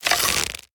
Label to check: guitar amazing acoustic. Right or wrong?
Wrong